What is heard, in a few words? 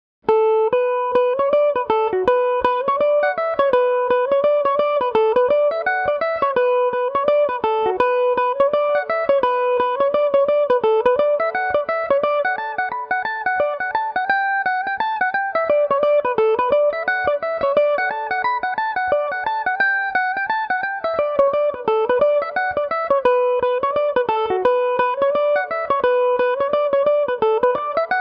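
B minor, irish mandolin; jenny nettles fancy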